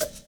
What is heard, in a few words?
fat stab; Univers Sons - Kitch 70s